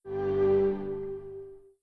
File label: Windows Odyssey 2001 Shutdown; Windows Never Released Soundpack 2